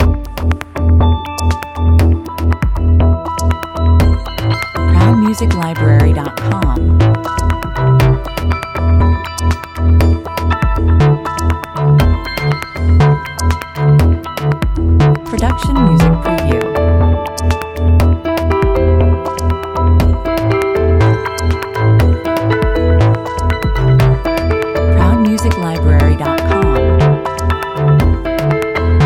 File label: news trailer, 120 bpm